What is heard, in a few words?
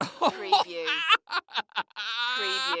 Laugh Hysterical Male; Sound Effects Bible